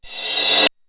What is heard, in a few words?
KOMMERZDRUMS